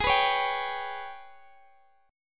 Ab Minor Major 7th Double Flat 5th, Guitar, Open D; x,x,18,17,16,17; down